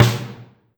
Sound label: Snare, Drum Machines DDrum-AT Pitched Down